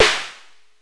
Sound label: Bass Snare, Jomox Airbase99